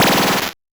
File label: Noise A, 8-bit, Bitty Noises; musicradar; 8-bit bonanza